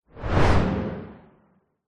sound design sweep transition hard hitting whoosh dark; zapsplat